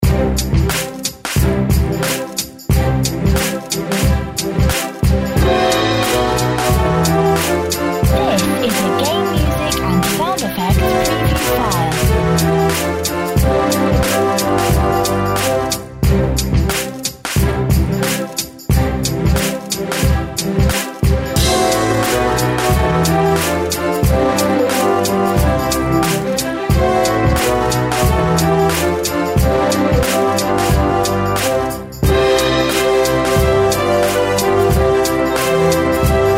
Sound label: Hip Hop Wedding March